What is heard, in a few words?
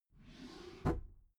desk drawer open regular